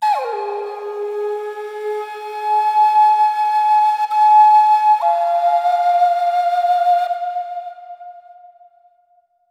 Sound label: Echo Flute; Flutes